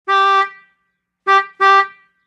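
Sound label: 1940 Car Horn Single Tone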